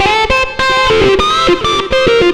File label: xtra lead